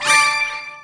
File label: Exclamation; Wormageddon